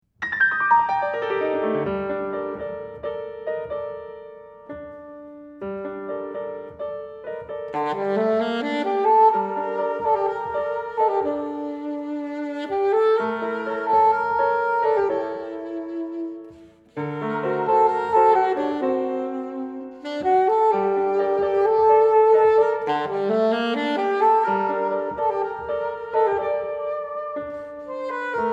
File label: sax piano